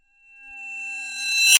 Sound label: Sword Sound; FXHome; Fighting Sounds